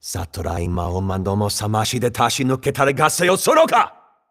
chant; nahyuta

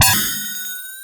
warp ring; sonic

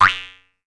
sproing; umi

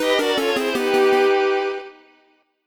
mission fail

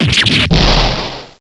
fan

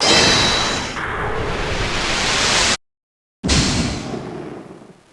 lose; aai logic

